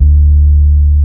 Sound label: BASS D 2 SMTH, SYNTHBASS